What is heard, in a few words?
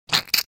Ketchup bottle squeeze press squirt; cooking